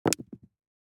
DICE on Felt, Throw and Roll, Standard, 3 Three Dice; Samples; ArticulatedSounds; DICE Sfx Party (Extended)